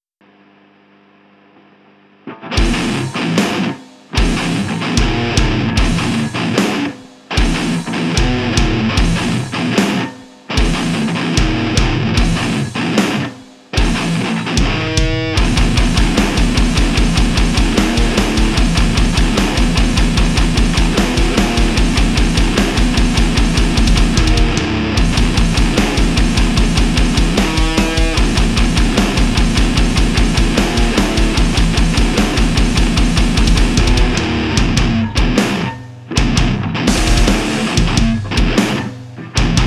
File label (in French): guitare